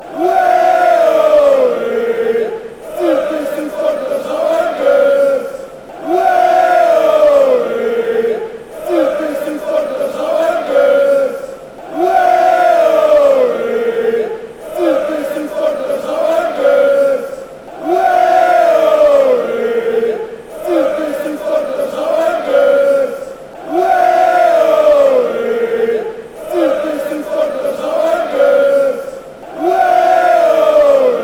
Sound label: club brugge chant